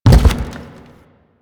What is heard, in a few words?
Gas Tank Impact; Nineteeen Ninety Nine; PvPvE